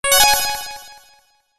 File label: ALERT